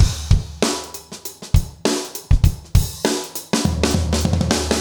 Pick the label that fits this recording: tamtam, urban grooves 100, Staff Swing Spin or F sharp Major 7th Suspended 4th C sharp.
urban grooves 100